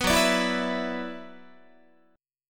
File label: Gb 7th Suspended 4th Sharp 5th Gb Guitar; Gb7sus4#5 strummed